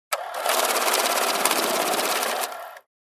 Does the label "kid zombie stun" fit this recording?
No